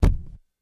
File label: Camera flash, flash pot, deeper, less explosive; cameras